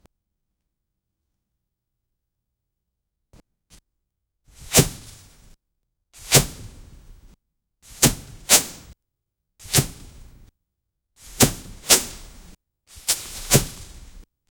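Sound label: heavy step in steel armor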